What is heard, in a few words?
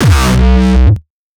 kick; shock. Ultimate Kicks vol. 2